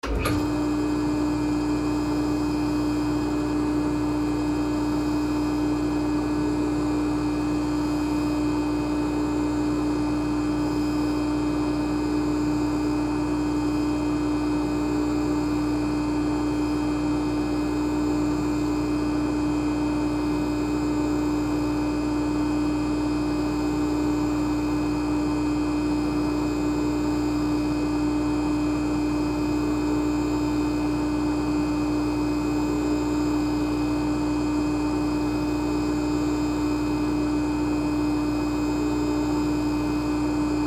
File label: Jacuzzi Jets On Run, Jacuzzi Jets On Run Off No Water Motor; Bathroom; Household; Blastwave